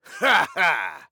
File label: Laugh; Owl; Paladins